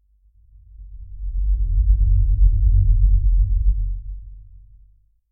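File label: explosive light bloom soft; radiant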